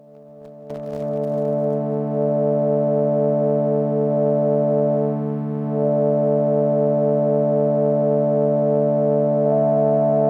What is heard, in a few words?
D drone